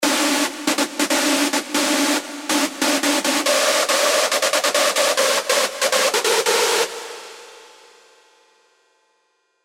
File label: Screech Paranoise; revealed serum 2 rave asylum